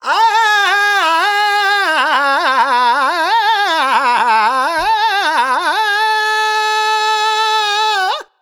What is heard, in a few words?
Vox, Vocals Male, World Pop